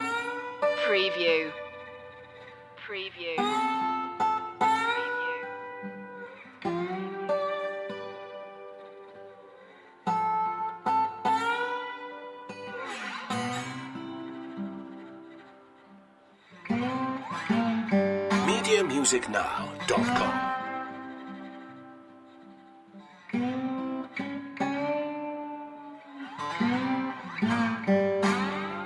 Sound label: delta mercy loop